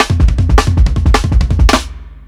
105 TRIPLET ROLL, DRUMS LOOPS; BASEMENT DRUMS VOL 2

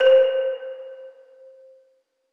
Pluck 13 - C; 99Sounds; Monosounds; Music Loops; Instrument Oneshots

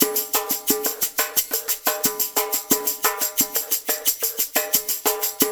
percussion loop; InVision Interactive; Percussion Slam; BREEZE

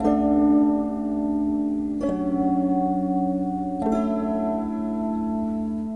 Gong Song; Sonic Foundry (Sony Creative Software) - Friendly Fire

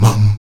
VOX MUM G#2, Afro Chants; Roland LCDP11 Africa VOL-1; Stacc Mums

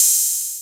OHH, Roland JX-305

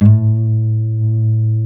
A 2D HANS NYLON, Guitars; Spectrasonics; Hans Zimmer Guitars VOL-1

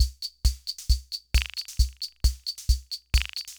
Loop, sr-95; classic machines

